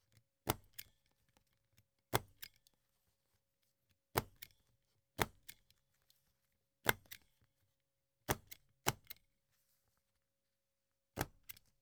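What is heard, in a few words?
stapling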